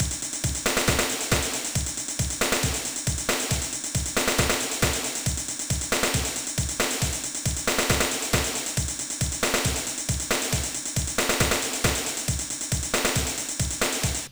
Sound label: Dynamic Drums Pattern; Oakvalley